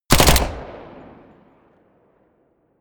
smg uzi 45 close burst

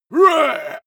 male shout hit short

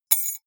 key ring small drop stone

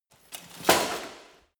light bodydrop

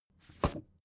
book take shelve hard

